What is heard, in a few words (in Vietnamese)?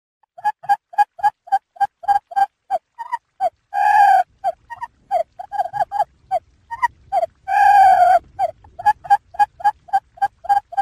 Tieng Chim Cut Moi Goi Bay